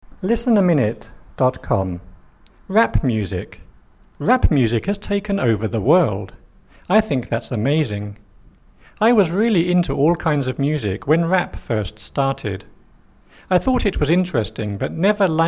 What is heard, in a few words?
rap music